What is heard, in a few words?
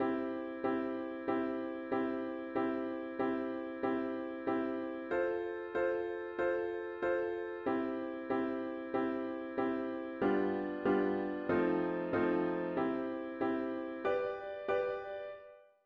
chords